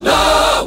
"no" SUNG; Voice; expletives

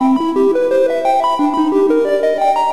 bleepin magic, Electronic Loops

